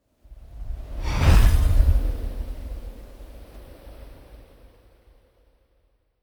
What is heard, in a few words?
magic spell wind shimmer; swooshes-swishes